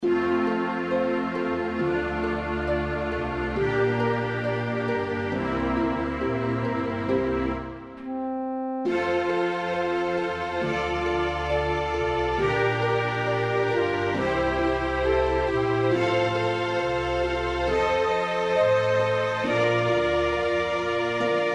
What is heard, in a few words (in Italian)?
Canto dell'addio, flauto dolce; impariamo divertendoci col flauto dolce